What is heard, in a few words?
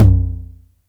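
909 low tom tape; Goldbaby Tape808 & Tape909; The Tape 909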